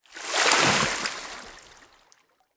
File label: Cauldron Splash